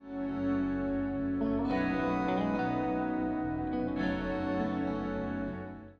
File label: keys